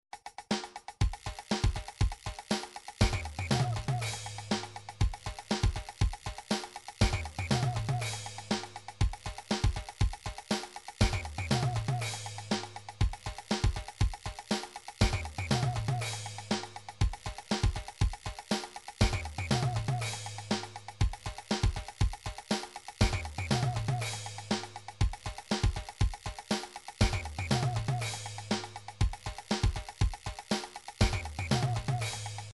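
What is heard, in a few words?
drums pattern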